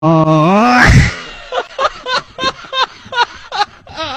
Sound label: arab laugh